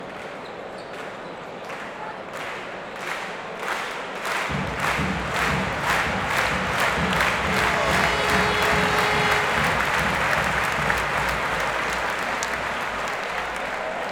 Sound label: International basketball game - drum beat, excited crowd clapping in unison; Sound Ex Machina; BASKETBALL GAME ULTRA